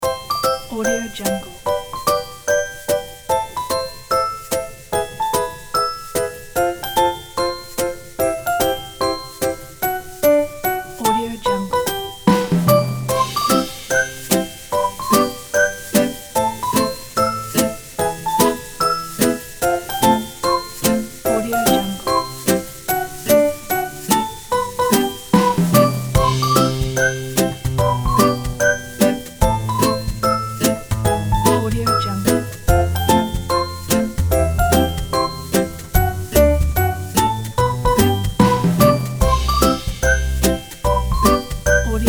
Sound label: happy cooking